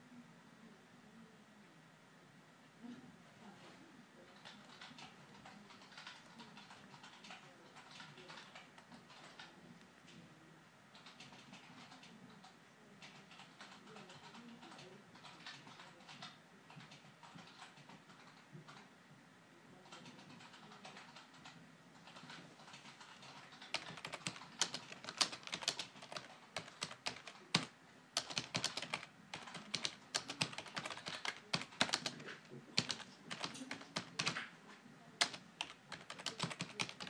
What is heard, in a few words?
office ambience